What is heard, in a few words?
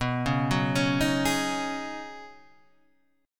Db 7th Suspended 2nd Suspended 4th B, chord shape